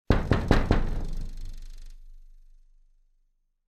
stuk po steklu

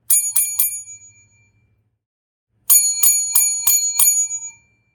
servisnyi zvonok

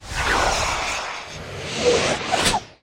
strela proletaet zamedlennoe vremya

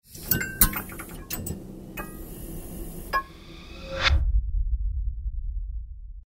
mercanie lamp

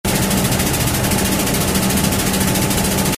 gluk

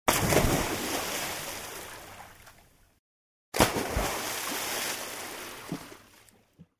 zvuk pryzhka v vodu

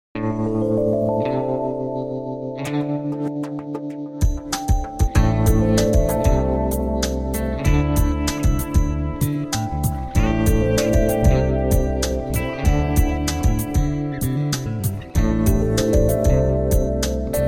muzyka dlya instagram

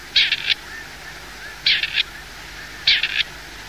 kuropatki seraia

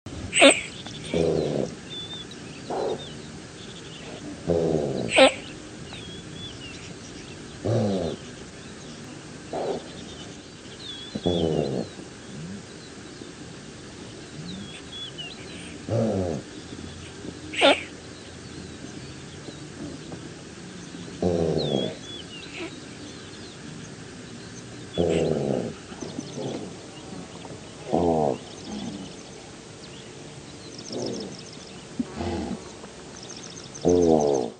gazelle, zvuk gazeli

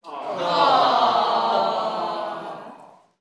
deti ooooo rasstroeny

